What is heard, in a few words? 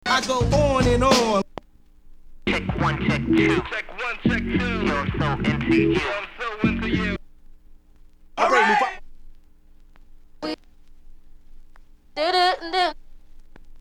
samples scratches; wicked mix vol 025